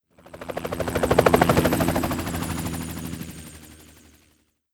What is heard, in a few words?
HELICOPTER